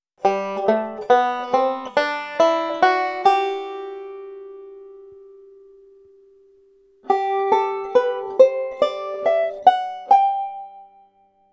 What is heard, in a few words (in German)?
g dur tonleiter melodic style